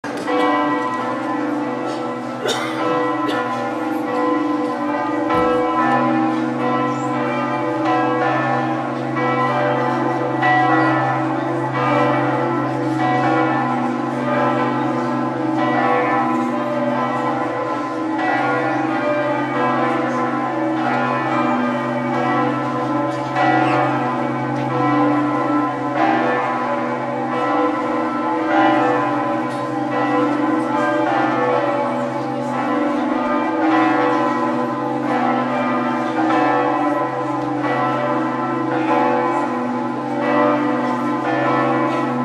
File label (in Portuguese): Sinos de Fatima a convocar